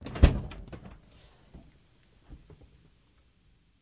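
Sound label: Cutlass Interior Door Open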